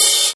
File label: soft open hi hat sound a key